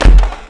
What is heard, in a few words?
chest open